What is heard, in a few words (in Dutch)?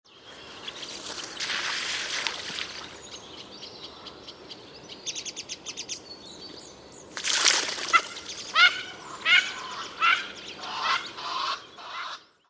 Mandarijneend Aix galericulata